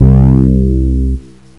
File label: Synths BASS